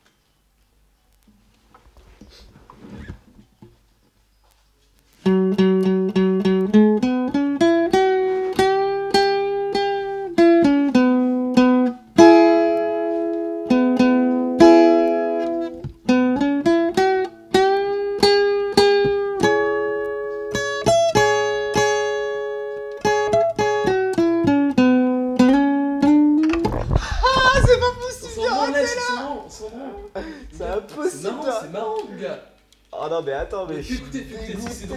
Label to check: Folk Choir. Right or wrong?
Wrong